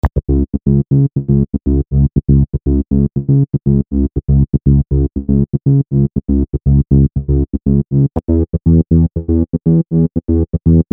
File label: Bass; MobileMusic No1